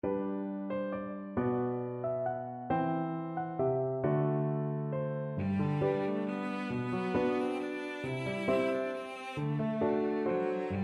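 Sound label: what shall we give violin violoncello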